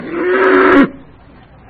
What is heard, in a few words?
pain